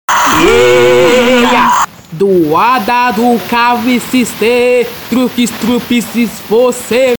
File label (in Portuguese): toada